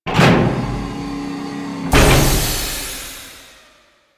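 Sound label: Cannon Pod Hatch Close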